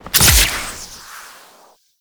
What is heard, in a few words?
Grapple Throw; Warframe; Teshin; Weapons